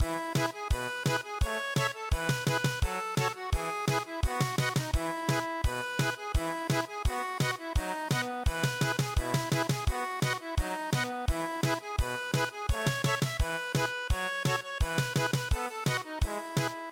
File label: German Polka, traditional